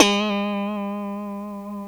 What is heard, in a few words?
A 3 Funk Pop, Funk Strat